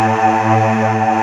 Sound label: GLASS A A2, D50; D50 STORY; East West; Global Sounds